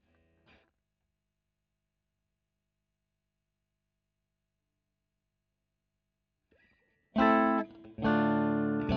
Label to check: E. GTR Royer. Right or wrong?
Right